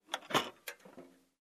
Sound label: Construction